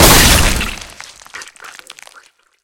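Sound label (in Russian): звук разбивающейся посуды с вытекающей из нее жидкостью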